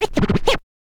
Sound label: scratch kit, vinyl